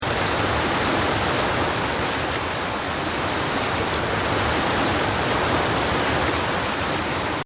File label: River; Rivers And Streams; Partners In Rhyme